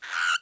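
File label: familiar attack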